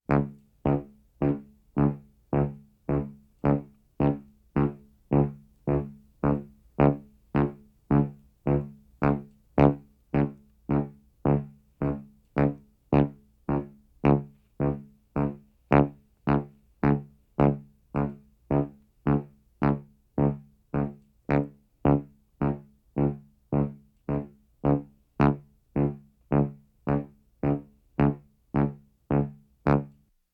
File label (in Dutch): Electronische Tonen Medium; KMP Film Video Sound Effects